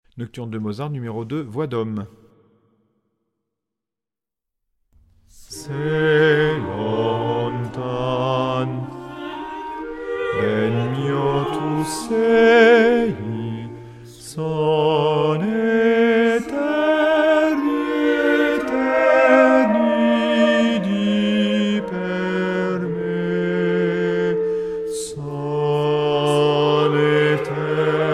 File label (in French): hommes; se lontan